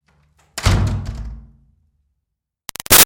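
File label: Door Wood Church Basement Room Close Hard; Miscellaneous Door Sound Effects; Big Room Sound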